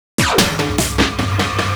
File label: Cool machine Fill, Drums; Fills; Misc Fills